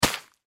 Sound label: Rock Salt Bag Hit, Foley Rock Salt Bag Impact Hit; Materials; Blastwave